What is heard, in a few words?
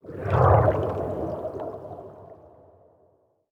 Underwater Attack Underlay